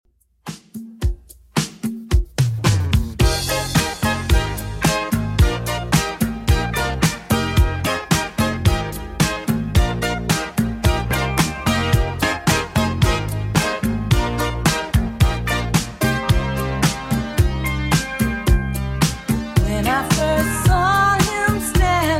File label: 80s Redrum 110bpm